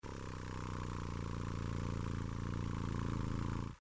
Ronroneo; Banco de Sonidos - Facultad de Art; Lazzeri - Gallichio - Alperte - Calvo

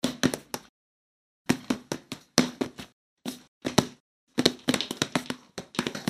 Pataleo; Banco de Sonidos - Facultad de Art; Leitao - Cazanave - Capelluti - Suasnabar

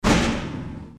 Estruendo; Banco de Sonidos - Facultad de Art; Díaz - Márquez - Loustau

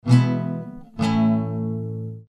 Rasgueo; Banco de Sonidos - Facultad de Art; Díaz - Márquez - Loustau

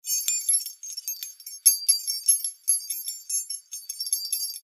Tintineo; Banco de Sonidos - Facultad de Art; Díaz - Márquez - Loustau

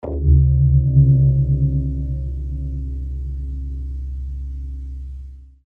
Retumbe; Banco de Sonidos - Facultad de Art; Díaz - Márquez - Loustau

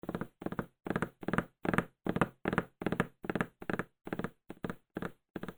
Tamborileo; Banco de Sonidos - Facultad de Art; Lazzeri - Gallichio - Alperte - Calvo

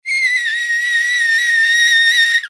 Chillido; Banco de Sonidos - Facultad de Art; Díaz - Márquez - Loustau

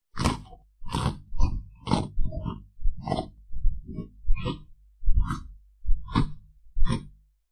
Crujido; Banco de Sonidos - Facultad de Art; Díaz - Márquez - Loustau

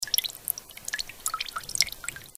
Goteo; Banco de Sonidos - Facultad de Art

